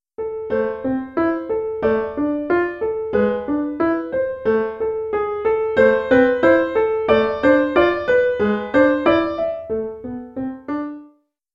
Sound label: elementary piano solo